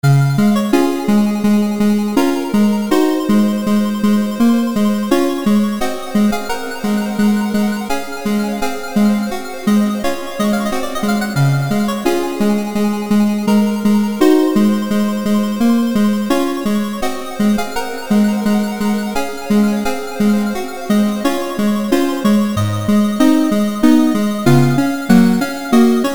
frederic chopin prelude op28 no15 raindrops 8bit

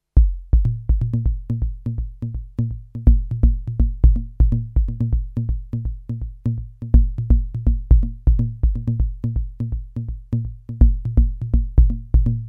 korg volca polyrhythm

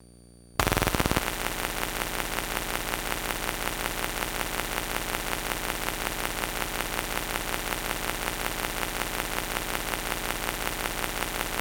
lsdj mellow noise drone; duckhunt

